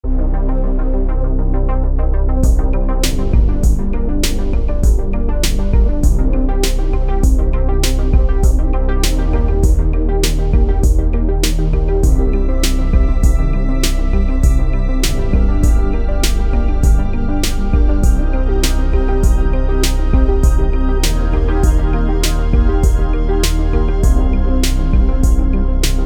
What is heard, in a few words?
dark Synthwave